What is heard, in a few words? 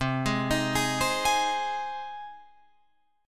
C Minor Sharp 5th, Guitar, Open F, Cm#5 chord; arpeggiated; JGuitar